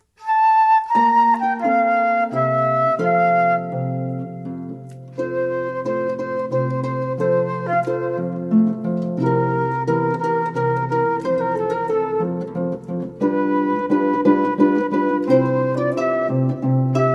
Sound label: Minuet; Mozart